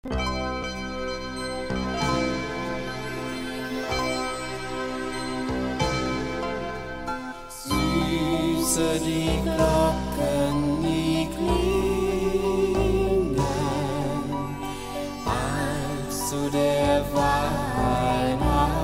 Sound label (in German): Süßer die Glocken nie klingen, Christmas Music